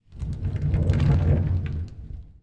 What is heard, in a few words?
classroom door open